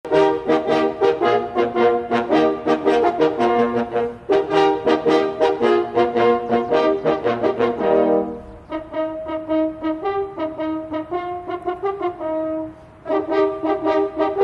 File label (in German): Jagd und Parforcehornblaeser; Frankenfelder